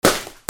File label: CARTOON